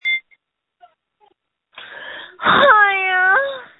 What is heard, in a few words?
Scream